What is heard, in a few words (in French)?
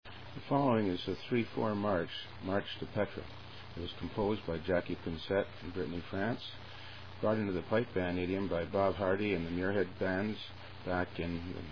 Marche